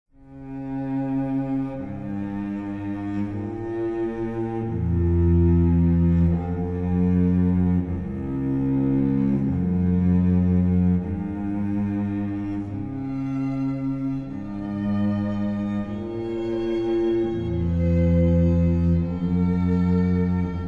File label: Canon in D, string quartet; Pachelbel